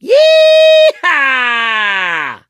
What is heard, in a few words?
positive noise, mechanic, survivor, voice